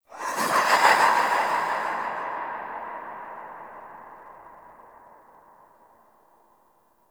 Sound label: Movement Accent, ambience; Warframe; Harrow Quest; Operator Twin